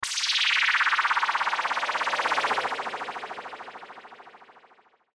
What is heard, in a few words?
Sci Fi Ufo Pass By, Sci Fi Spaceship Whoosh Swirl; Spacecraft; Blastwave